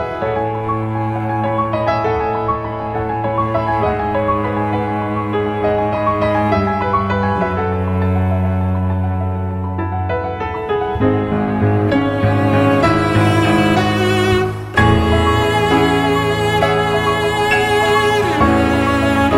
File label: PIANO CELLO